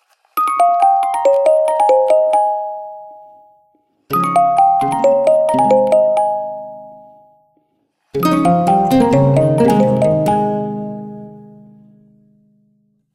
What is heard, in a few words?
Music Box; Nokia; Windows Phone apps